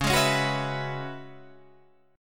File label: C sharp Minor 11th C sharp, Guitar Half Step Down; C#m11